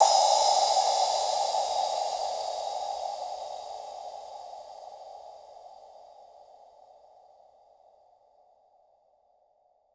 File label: Usual Crash, cymbal